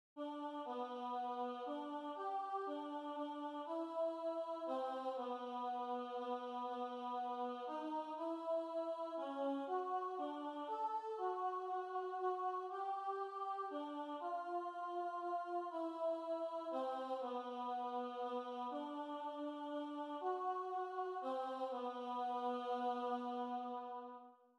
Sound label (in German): amazing grace alt